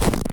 snow footstep; minetest; capturetheflag

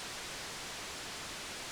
grenades hiss; minetest; capturetheflag; pvp

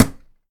place node metal; minetest; capturetheflag

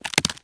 tool breaks; minetest; capturetheflag

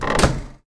chest close; minetest; capturetheflag

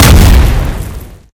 grenades explode; minetest; capturetheflag; pvp

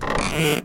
chest open; minetest; capturetheflag

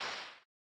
item smoke; minetest; capturetheflag

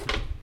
metal footstep; minetest; capturetheflag

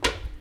metal footstep; minetest; capturetheflag

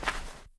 dirt footstep; minetest; capturetheflag

